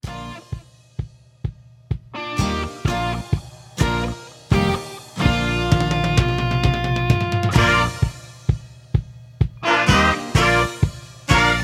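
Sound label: Instrumental without background vocals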